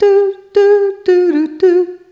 Rhythmic G

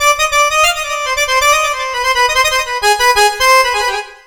SYNTH, ARABIC; USB Soundscan vol.43 - ArabianTraditions; AKAI

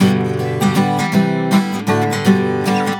GTR6, Celtic Flavours; USB Soundscan vol.42; AKAI

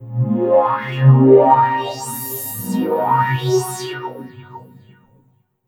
SYN, 160 BPM; Ueberschall; Drum & Bass Flash Trackz

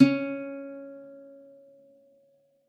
Soft C#4, Campfire Nylon guitar; Worra's Campfire Nylon

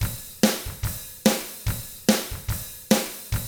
ROCK, Double Platinum Drums

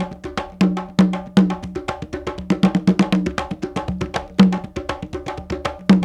Bembe 6/8, World Dance

